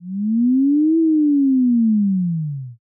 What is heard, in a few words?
whale